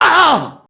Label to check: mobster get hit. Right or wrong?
Right